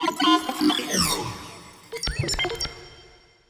portrait machine; Stellaris; toxoids; portraits

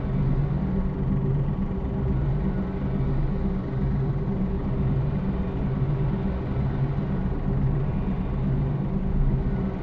ship pre-FTL combustion idle; Stellaris; first contact; ships

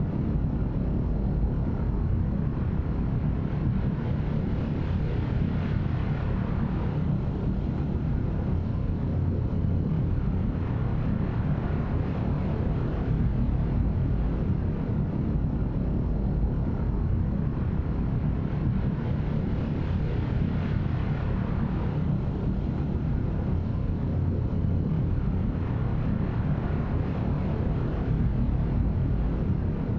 ship construction ship idle; Stellaris; machineage; ships

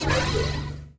queing spaceport module; Stellaris

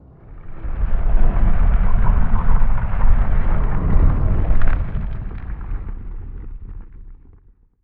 moving out colossus; necroid; Stellaris; necroids

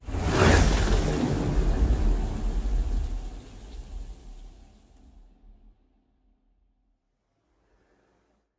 ship destroyer move; Stellaris; machineage; ships

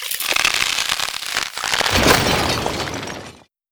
crystal ship death; Stellaris; other entities

